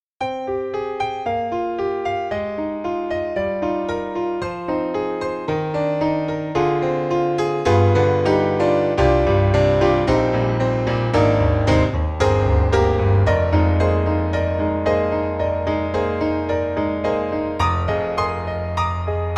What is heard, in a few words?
Mini Grand Always Loud; AIR Music Technology